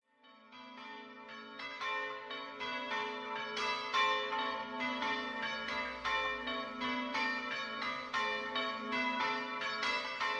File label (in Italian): VILLANOVA di San GIORGIO, campane